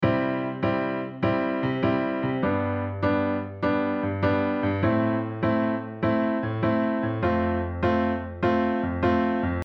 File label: shuffle